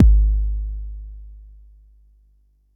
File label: Bassdrum; Jomox Xbase09